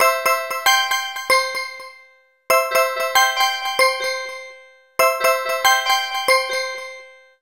Alarm Antelope, BlackBerry Bold; BlackBerry 9000-Bold

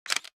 cam snap; LG X5 2018

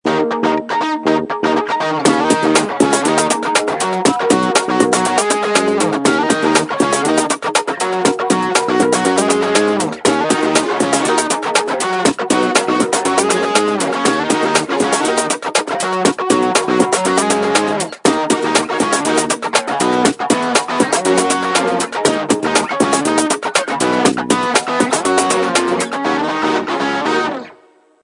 Rock, ringtones; Okapia Air

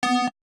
dial tone; Oppo R7

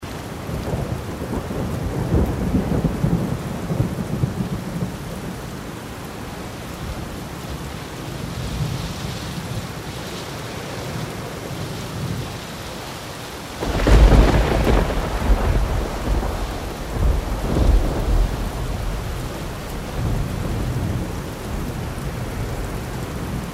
Rainstorm; Sony Ericsson W20i Zylo

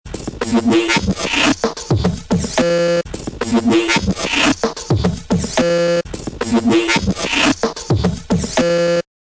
Distortion; Motorola SLVR L6 Latin America; MTV

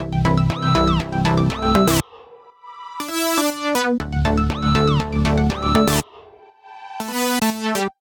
electro, alarms; Google; Google Sounds; Play It Loud

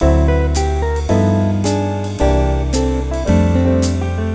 Jazzy, ringtones; Google; NewWave Labs

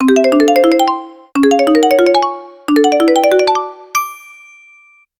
ringer bounce, ringtones; Alcatel OT-3088X

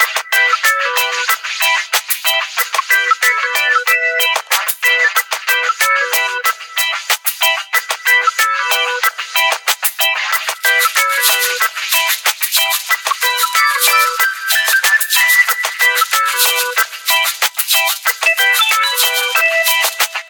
music reggae organ; Samsung GT-i7680; cmcc

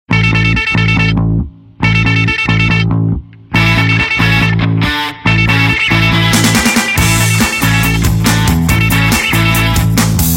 Alarm rock, Ringtones; Nokia; 5220 XpressMusic

